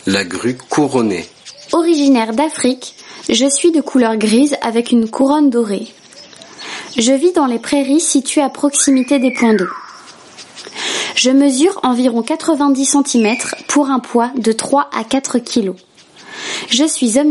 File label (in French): Grues Couronnees